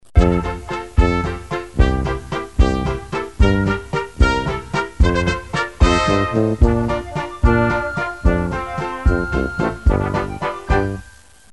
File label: polka; army; music